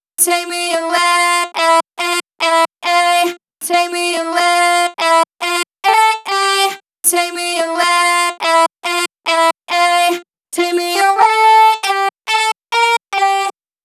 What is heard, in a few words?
Female Vocals Chorus DRY, 140 BPM; Vengeance Samples; VTDS2 Song Kits; Female Take Me Away